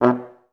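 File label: FHS1 staccato B1, Advanced Orchestra; Best Service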